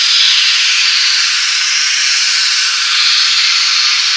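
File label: pipe steam loop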